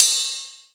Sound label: Reverb Ride Cymbal C# Key, Royality free ride cymbal drum sound tuned to the C# note